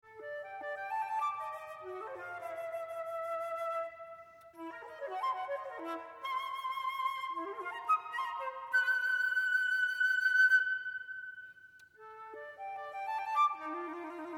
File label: Flute Concerto